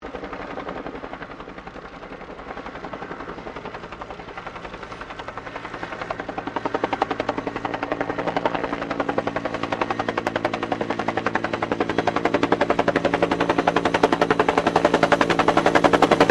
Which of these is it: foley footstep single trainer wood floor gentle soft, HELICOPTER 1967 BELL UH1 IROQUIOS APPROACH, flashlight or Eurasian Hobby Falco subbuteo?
HELICOPTER 1967 BELL UH1 IROQUIOS APPROACH